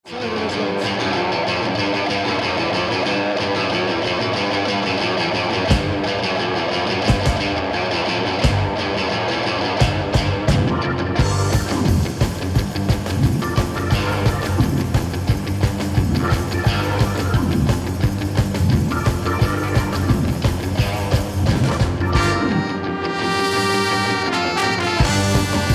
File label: Ska Jazz